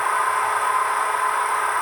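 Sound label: background loop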